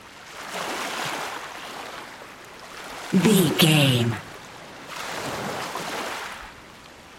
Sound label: beach waves ocean water soft loop